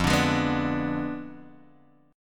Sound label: F Diminished 7th, Guitar, Drop D; 3,5,6,4,3,x